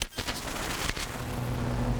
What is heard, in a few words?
engine start, sci-fi sounds, mechanical